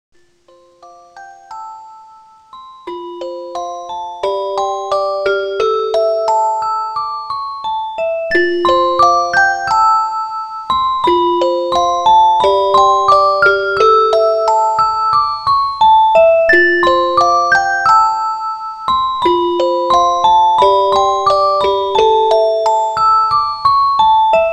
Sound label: orgel mix